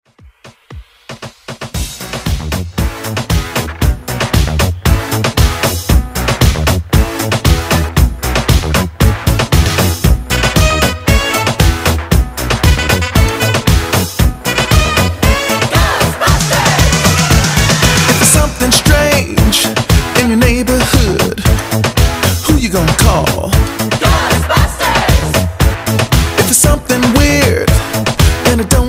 Moombahton Redrum

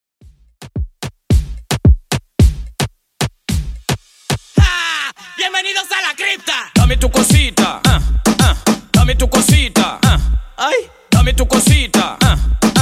Latin, 110 bpm; 2ks Latin Redrum

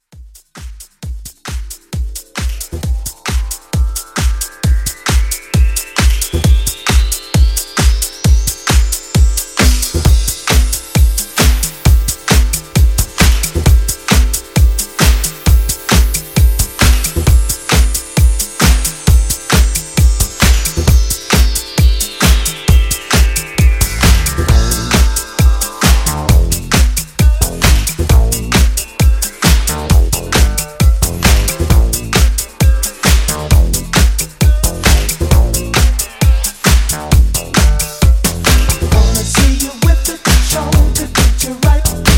80s Disco Re-Drum 133

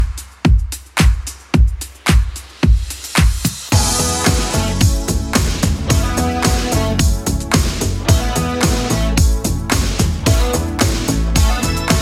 80s Club Edit 110bpm

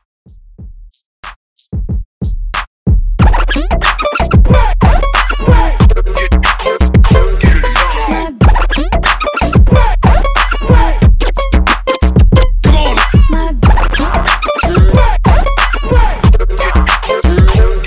Funk Scratch Remix 92Bpm

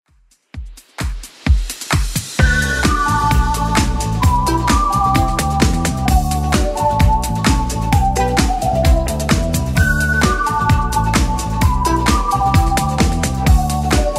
80s Club Edit 130bpm

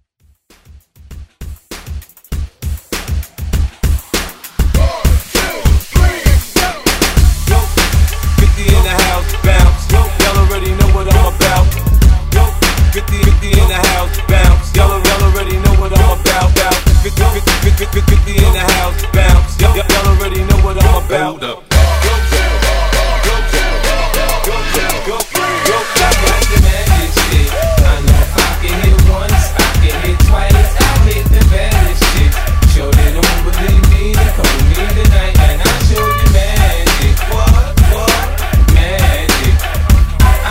Throwback

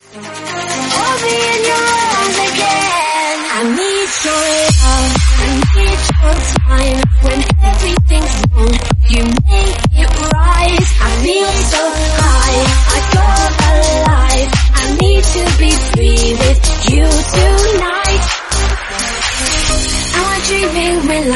128bpm Cm

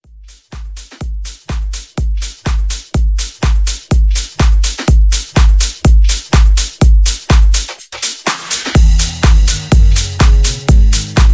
House Edit; Remixfreakz

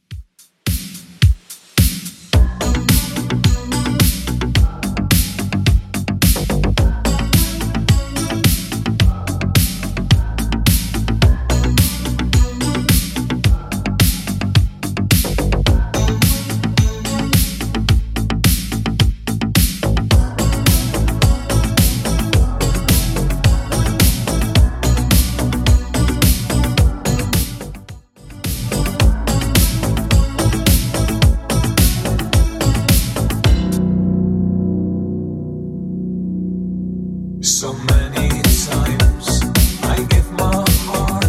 80s Redrum 108bpm CLEAN

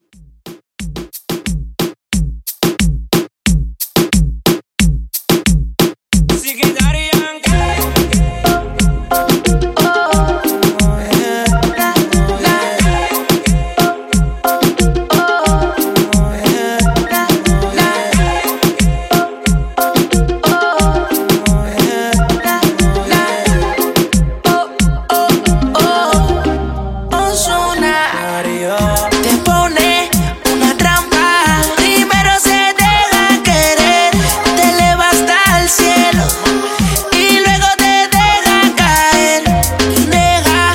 Reggaeton 90 Bpm

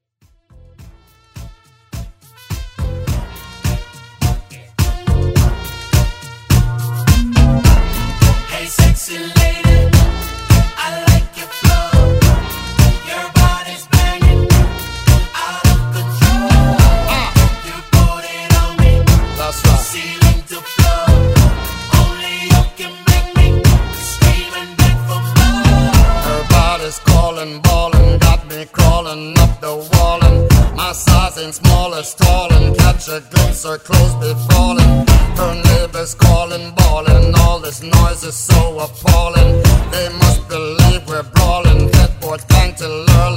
Moombah Edit

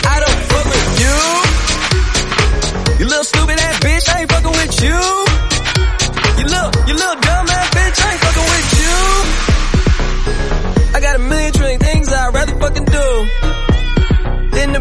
128bpm Bm

